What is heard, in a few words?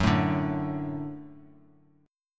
F sharp Minor Sharp 5th F sharp; strummed; JGuitar